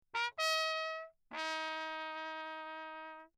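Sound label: trumpet mute Lechner signal; D Wick; Perinétventiltrompete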